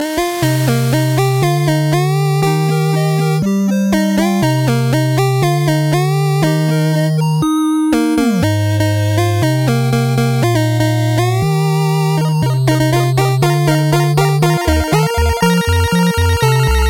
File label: 8bit